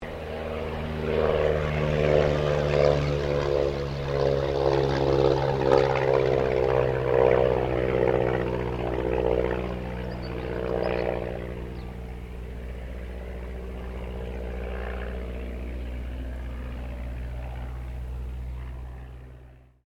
AIRCRAFT RYAN STM-S2 PASS; 1939 Ryan STM; Airplane; Airplanes; Vehicles

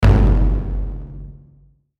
Hit Drum Low; Hit Drum Low Distortion; Musical; Blastwave

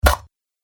Dagger Pierces Armor; Knife Sound Effects; combat

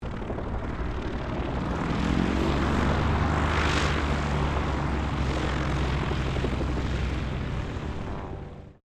AIRCRAFT CESSNA O-1 BIRDDOG TAXI; Cessna Birddog spotter aircraft; Airplanes; Vehicles

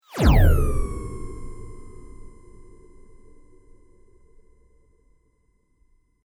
Sweep Mechanical Medium

